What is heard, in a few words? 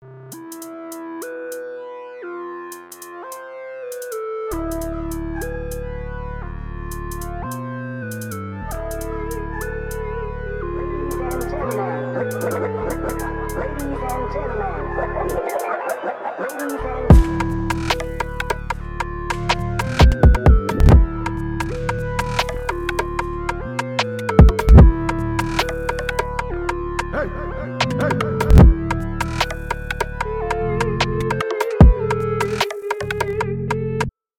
drill